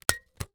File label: Household Hole Punch Paper